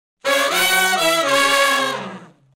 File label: Shuffle Blues Riff